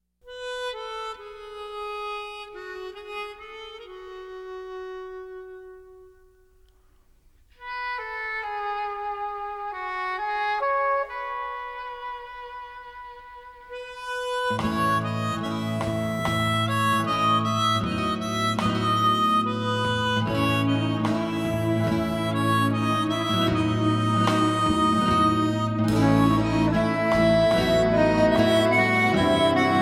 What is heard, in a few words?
harp